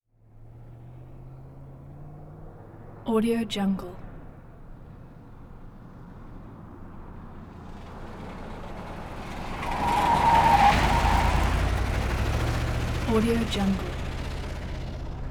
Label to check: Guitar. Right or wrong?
Wrong